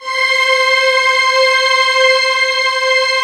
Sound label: symphonic C5